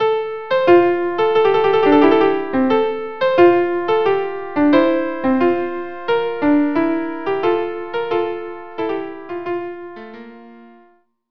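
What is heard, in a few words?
air violin violin viola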